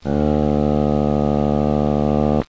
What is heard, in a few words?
vvvvvvt, cartoon sfx